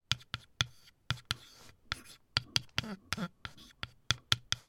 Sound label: office chalk writing on blackboard